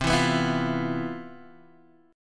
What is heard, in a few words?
C Major 11th C, Guitar Standard; CM11 strummed; JGuitar